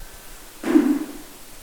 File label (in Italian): Colpo forte; Forte Belvedere